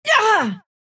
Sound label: pain small